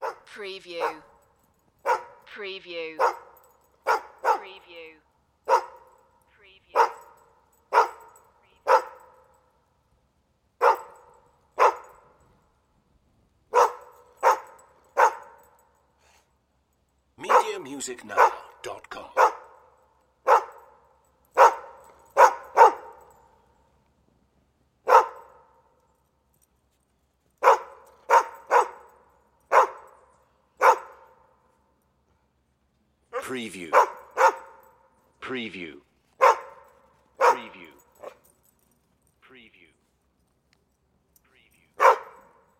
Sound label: Dog Bark Chain Rattle